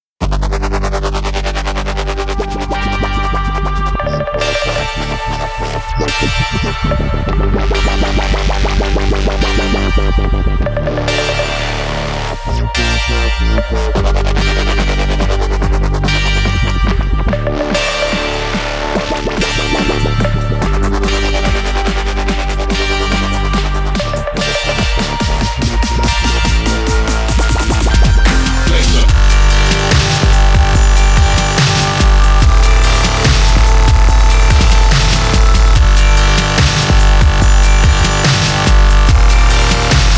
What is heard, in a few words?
reggae dubstep rasta music